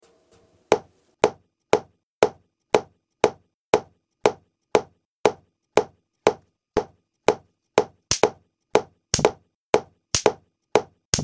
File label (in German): Electro; Musikprojekt La boite a lessive der Klasse 8L